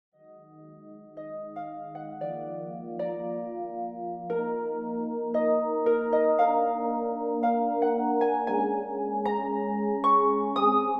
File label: celtic, harp